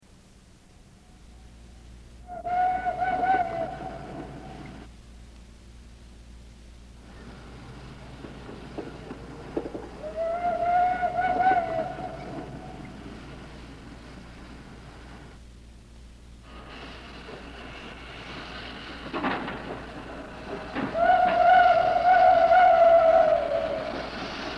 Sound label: PRR H10s Banshee Whistle; Sandusky OH; 1957